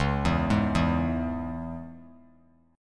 C sharp Minor, chord; C#m; arpeggiated; JGuitar

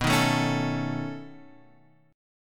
B 9th B down Guitar Open F; B9 strummed; JGuitar

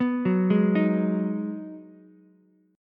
Ab Minor 7th down Ukulele; arpeggiated; JGuitar

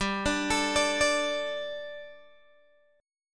G Suspended 2nd G, Guitar Open G; chordshape; down

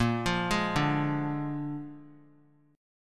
Bb 7th Bb down 3C,3F,3A,3D; arpeggiated; JGuitar

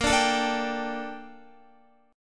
Bb Major 13th Bb down Guitar; BbM13 strummed; JGuitar

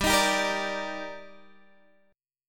D sharp Major 7th

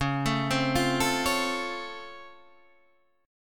Db Augmented Major 7th, down, Guitar, Standard; arpeggiated; JGuitar

